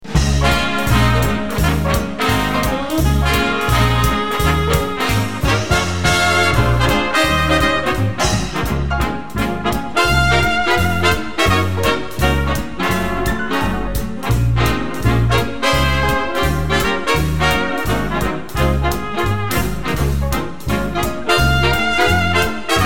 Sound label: swing